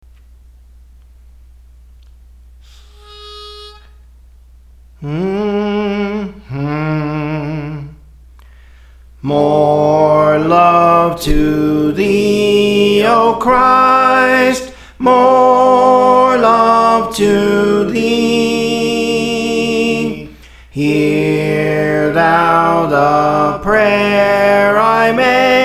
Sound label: Soprano; Praise For The Lord Training; More Love to Thee O Christ